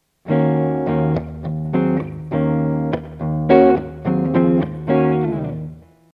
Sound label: rock